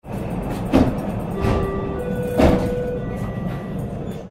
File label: metro; In de metro luisteren veel mensen naar muziek. Ze hebben de koptelefoon op